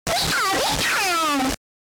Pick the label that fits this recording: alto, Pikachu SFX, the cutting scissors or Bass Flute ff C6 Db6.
Pikachu SFX